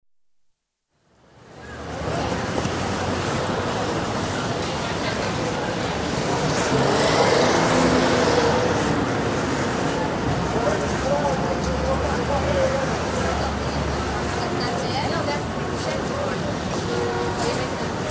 New York: Afternoon atmosphere in busy street; City Sound Effects; America; BBC

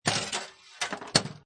Pinball Sound Launch Slide And Clank; Video Game Sound Effects

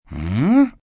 "Hmm" Question, male; Reaction

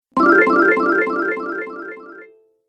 App Delay, ping; multimedia

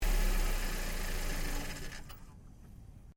Shutdown Engine Bay, car; Hyundai Accent 2008; Hyundai hire car; Vehicles

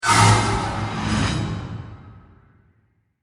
Whoosh Swirl Metallic; Production Element; Whooshes; Sound Design

